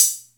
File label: Atlantex Music Percussion Computer HH closed, drum machine